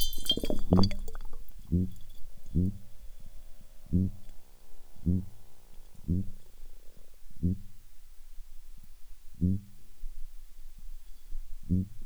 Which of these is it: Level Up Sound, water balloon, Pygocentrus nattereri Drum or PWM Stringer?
Pygocentrus nattereri Drum